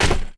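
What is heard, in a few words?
droid footstep dirt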